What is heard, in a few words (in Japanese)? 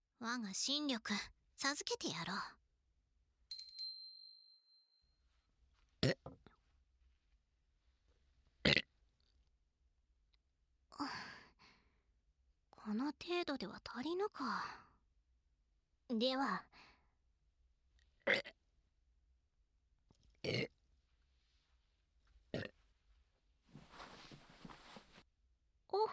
抜きゲップ, 効果音入り; 黄名子